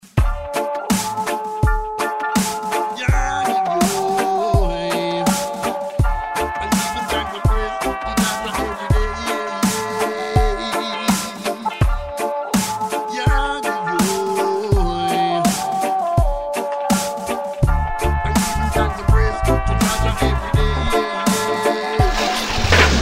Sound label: dubstep